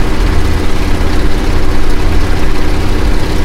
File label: griffon cockpit, engines; War Thunder